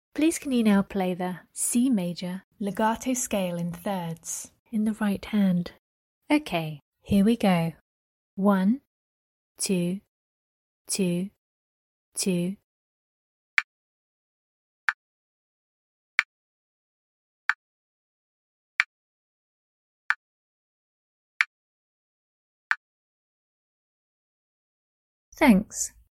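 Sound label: C major legato scale in 3rds right hand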